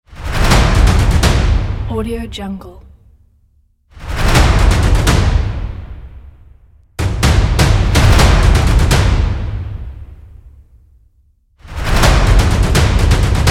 action drums

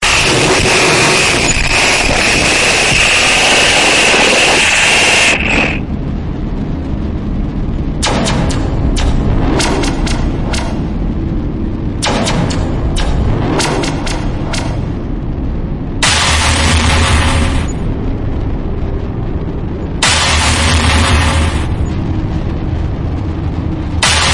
industrial strength cinematic noise

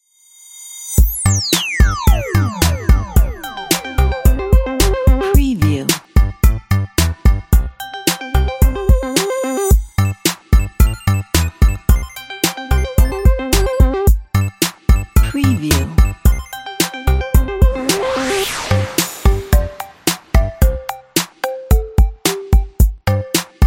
retro gaming; player one

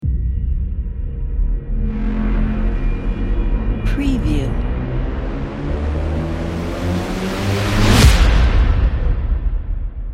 modern impact audio logo

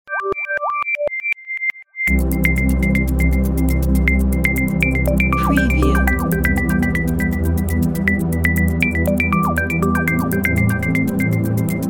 futuristic technology

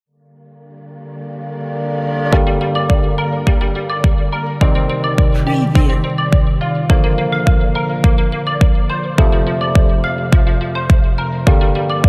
ambience electronic

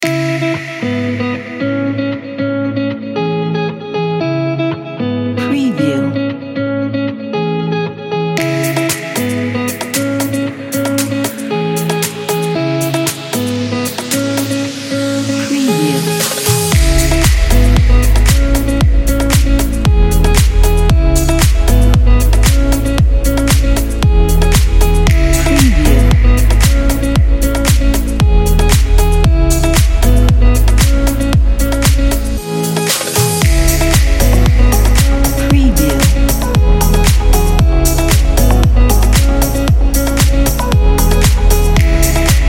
tropical

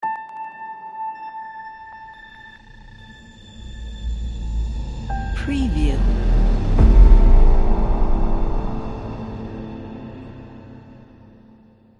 high tech piano logo

